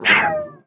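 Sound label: creature squall attack heavy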